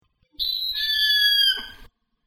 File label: scream, owl